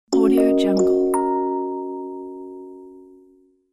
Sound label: kalimba message notification; نوتیفیکیشن پیام; interface sounds